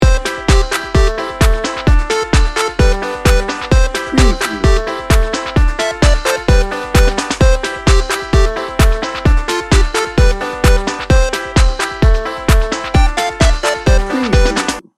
beach jam loop

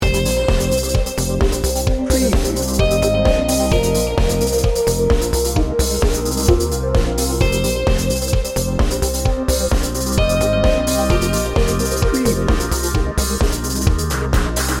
energy flow loop

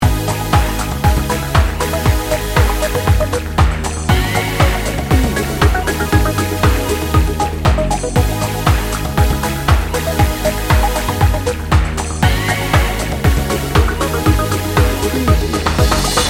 party chips loop

